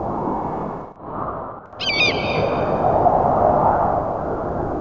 canyon; Lomax; AMBIENT

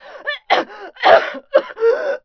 choke; PC Gamer IT CD 41; Daikatana; mikiko

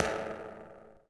tile; ambient; Shadow Man

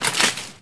Ball Branch; Tiger Woods

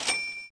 ka ching; SFX; PC Gamer IT CD 38; thief

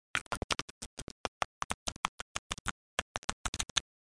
Mouth Noises

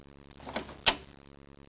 cnnect, fire; disaster

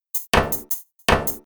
PERCUSSN D+B 160; eJay; Drum

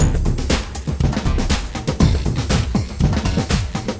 drum bass

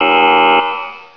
SIREN; CHASM

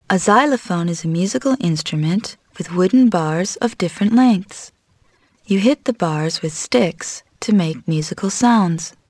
xylo

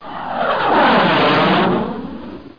jet fly

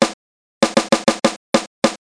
rythm

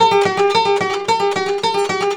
Kora; Loops; Sonic Foundry ACID Music

